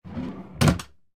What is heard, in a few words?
Drawer Open